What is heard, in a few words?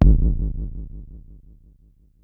Mod bd, Jomox Airbase99